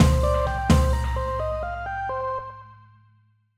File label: Forest Loop End